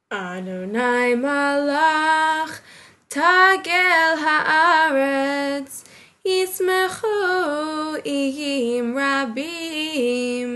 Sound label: psalm singing only